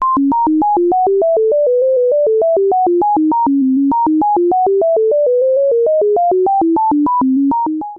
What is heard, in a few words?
chromatic illusion fade Left; Chromatic illusion (two-octave); Deutsch's Chromatic illusion (two-octave)